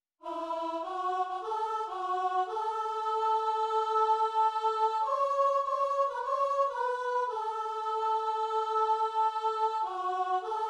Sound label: soprano; This Little Light of Mine